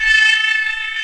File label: Electro FX; Ueb HE Electro FX